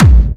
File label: Bassdrums Clubby Kicks; Vengeance Samples